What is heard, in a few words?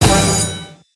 stop bonus; Flying Dutchman